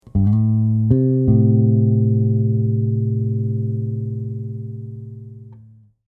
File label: A to C m3, bass; chords and double stops on bass